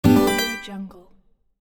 end of game

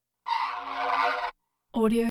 synth bursts low end cheesy rizzy buzzy

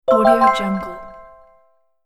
the correct answer

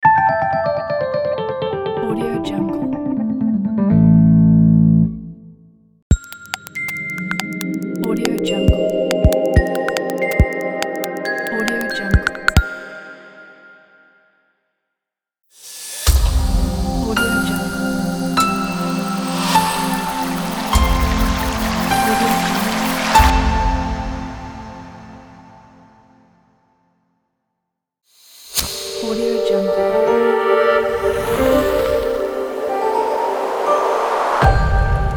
logo bundle

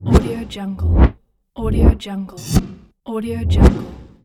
in swooshes

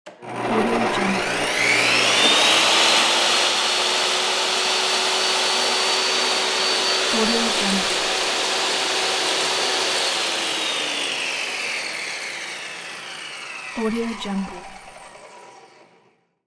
table saw sound

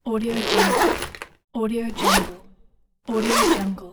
zip

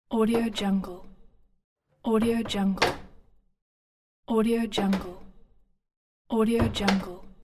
house door close and open

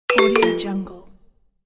percussive cartoon ding

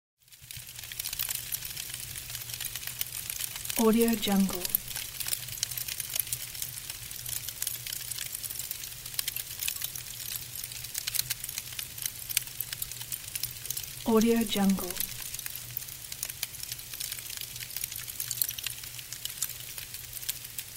sound of sparks and coals from the bonfire

